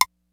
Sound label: JD-800 KIT